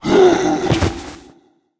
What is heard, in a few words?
orc mage dead, monster